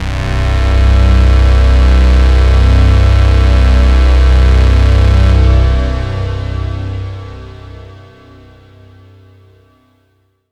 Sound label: Tone, IDM